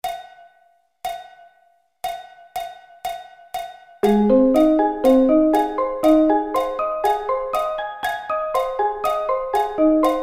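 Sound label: A flat Arpeggio Inversions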